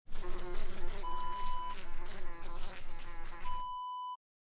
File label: fly